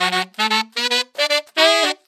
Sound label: 115 Pop Saxes Bb; Pop Rock